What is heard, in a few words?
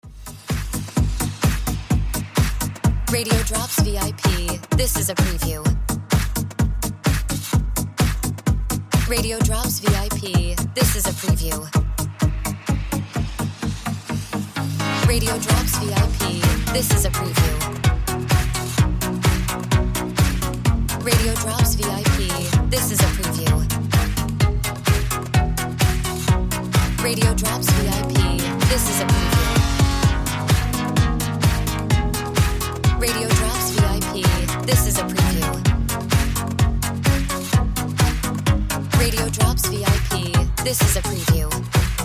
Music Beds